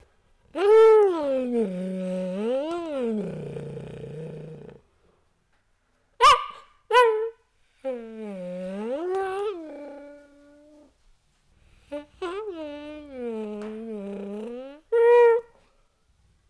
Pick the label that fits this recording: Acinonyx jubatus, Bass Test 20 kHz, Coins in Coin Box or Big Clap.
Acinonyx jubatus